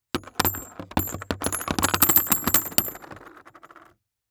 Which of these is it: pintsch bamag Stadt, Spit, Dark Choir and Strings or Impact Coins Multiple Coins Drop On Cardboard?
Impact Coins Multiple Coins Drop On Cardboard